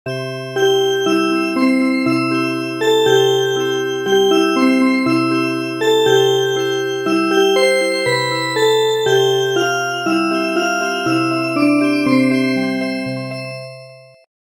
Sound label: Music Box; Umupo Po Kayo